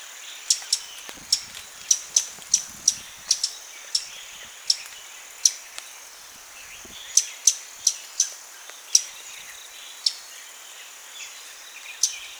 Plain Flowerpecker Dicaeum minullum

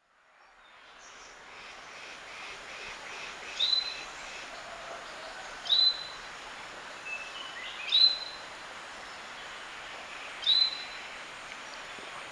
Grey headed Bulbul Brachypodius priocephalus

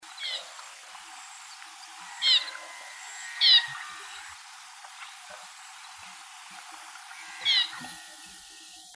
Black throated Munia Lonchura kelaarti, call

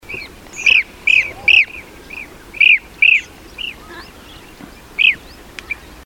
blue cheeked bee eater

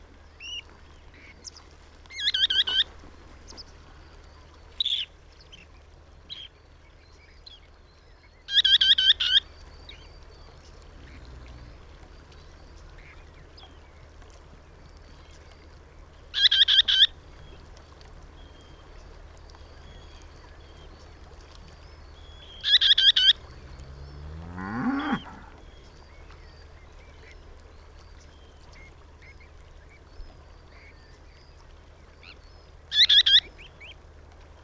Red Knot Calidris canutus, red knot call

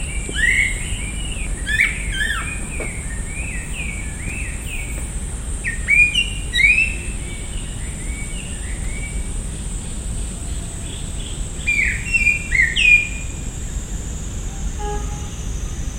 Grey winged Blackbird Turdus boulboul

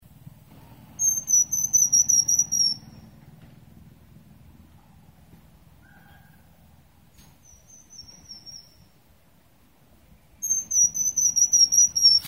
Nepal Cupwing Pnoepyga immaculata

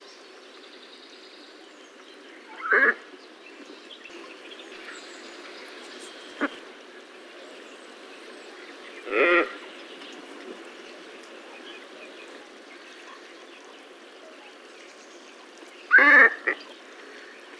Glossy Ibis Plegadis falcinellus

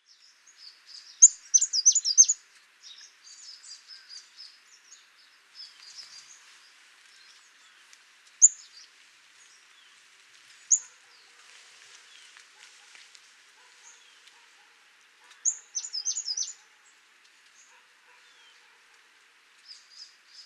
Ashy throated Warbler Phylloscopus maculipennis

